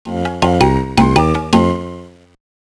Complete; Disney's The Lion King 1; GBA

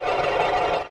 fire spiral prepare; flamebearer; Hollow Knight

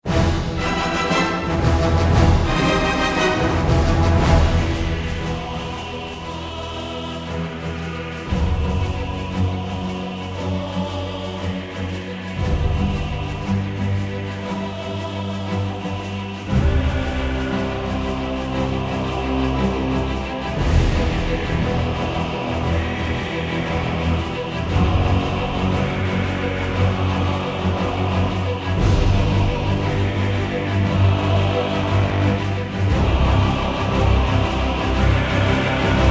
Ghost Ship Event; Heroes of the Storm

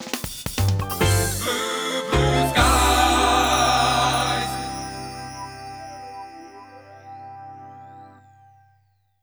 Goal; Sky High

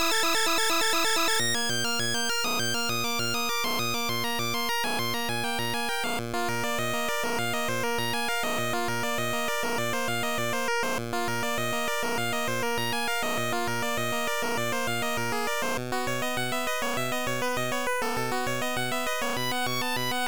Dungeon; Aighina no Yogen Balubalouk no Densetsu yori; Family Computer

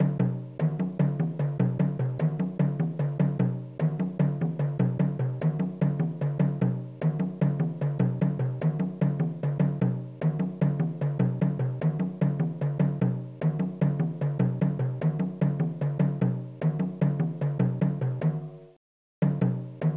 Jungle Rhythm; Pitfall The Mayan Adventure; SNES

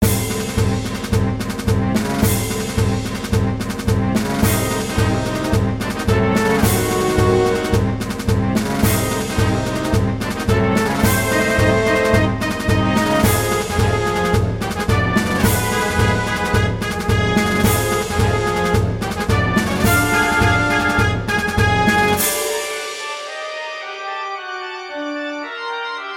bgm grass battle boss; world grass

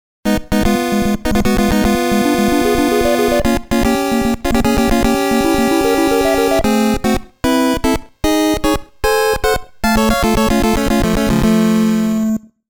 MISSION FAILED (GAME OVER); Game Sound Museum Namcot; Star Luster

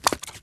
Diorama Squishy; Candy Crush Soda

